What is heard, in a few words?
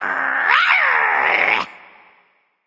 battlecry; smite; voicepack; kukulkan